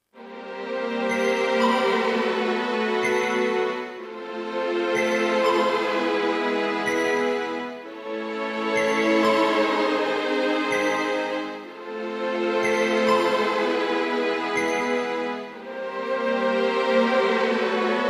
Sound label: Instrumental